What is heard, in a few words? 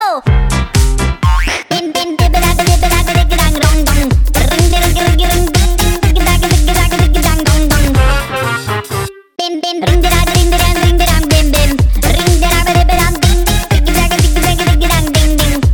Bing Bing Bong Bong